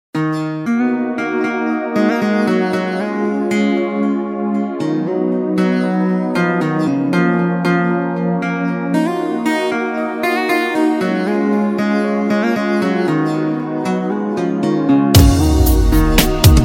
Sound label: romanian guitar